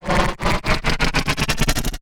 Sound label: Accelerating Sci-fi Rumble